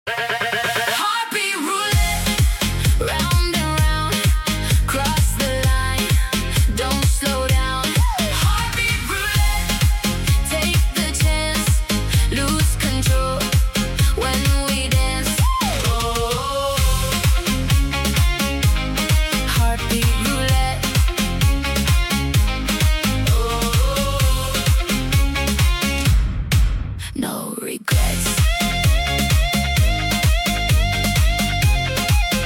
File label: heartbeat roulette; eraden